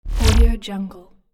powerful short digital whoosh

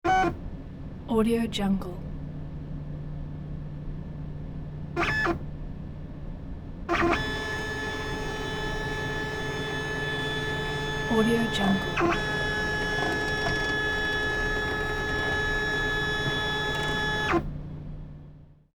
retro computer scanner

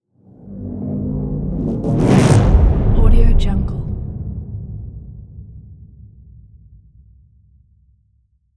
whoosh dramatic growl; sinematic 2; soundmorph

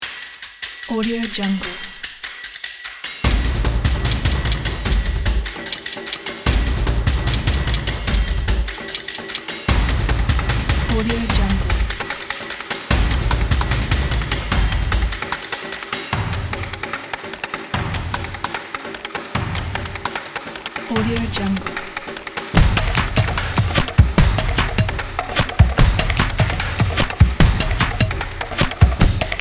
asian percussion

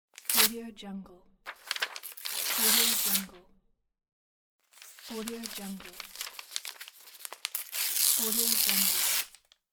paper tear

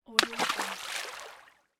rock into lake

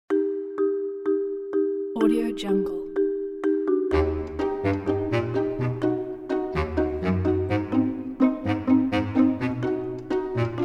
comedy cartoon; andrewstaller

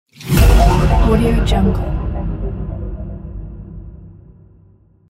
whoosh hit transforming fx; archimusic

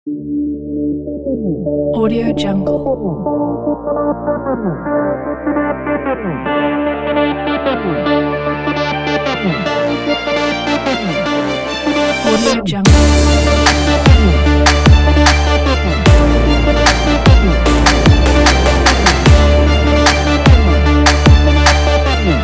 epical sport trap; rewindsound

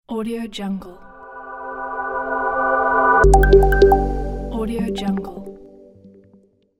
a modern style logo

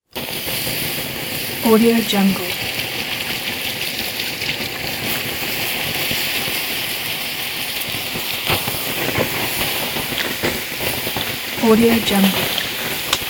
extinguisher

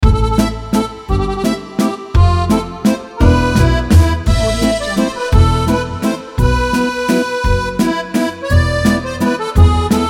french chanson

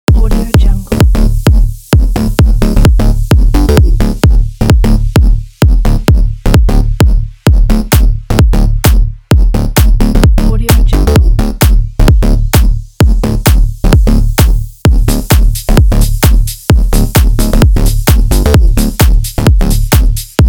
lift off electro house loop

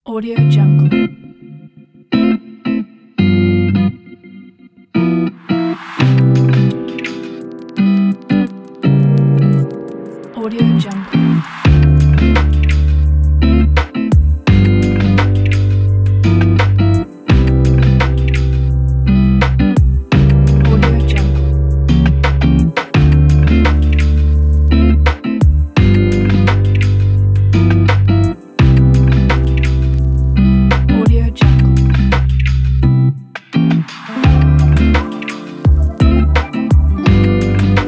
jazz lounge

